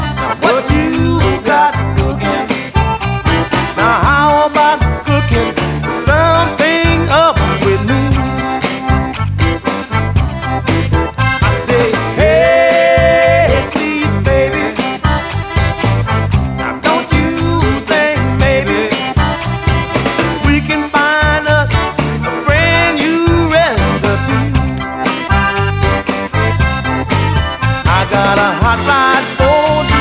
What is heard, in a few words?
blues